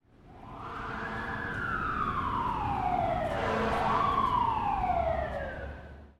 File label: Sirens; pulling no punches